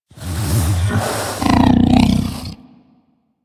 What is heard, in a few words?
Vocal Breathe Slow, critter; Warframe; Hunting Critters; Undazoa